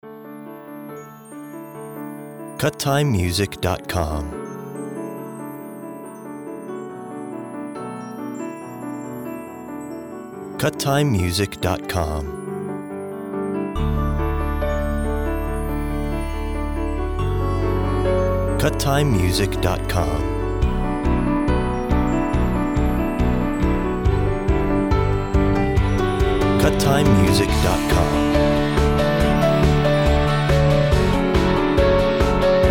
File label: Pop Hope